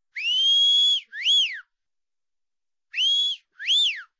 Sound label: Wolf Whistle